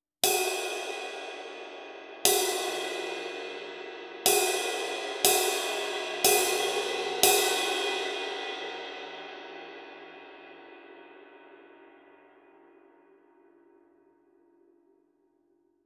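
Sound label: Medium Thin Low Bow, ride cymbal; Kerope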